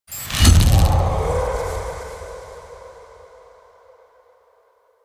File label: Sacrifice Symbol Found; Warframe; Quests; Chamber